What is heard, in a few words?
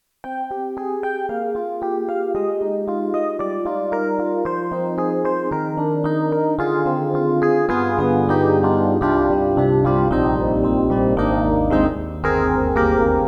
Yamaha MU1000EX DX Phase